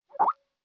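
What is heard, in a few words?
Skype sound, message received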